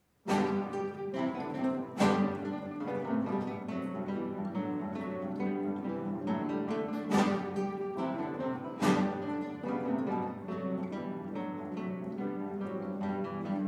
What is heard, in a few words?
Ensemble Russian Dance, guitar